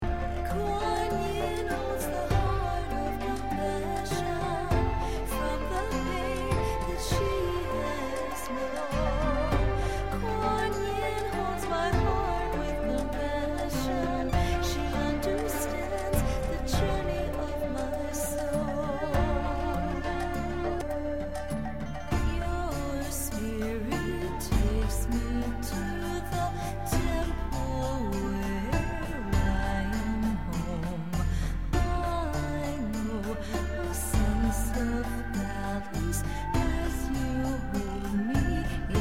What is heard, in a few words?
Kuan Yin Mantra